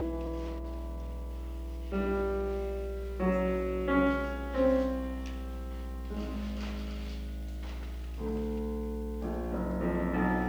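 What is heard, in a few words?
Quintet for Piano and String Quartet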